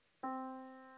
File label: Yamaha Disk, piano sound